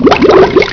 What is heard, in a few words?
BUBBL; psion cd vol1